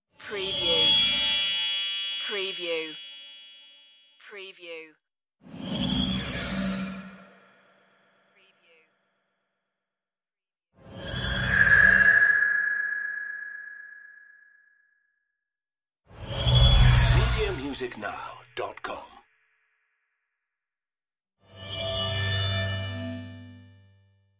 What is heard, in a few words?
hollow cyberspace flybys